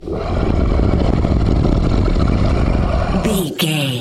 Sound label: troll monster growl